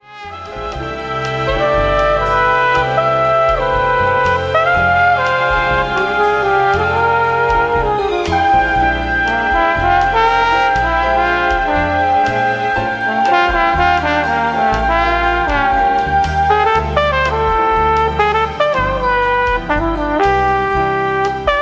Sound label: jazz orchestra